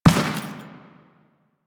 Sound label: footstep dirt; Enemies; Warframe; Teshin; DE